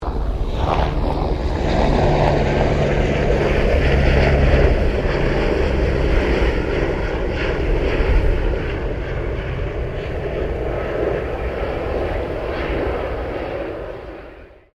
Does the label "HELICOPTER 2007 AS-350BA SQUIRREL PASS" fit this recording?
Yes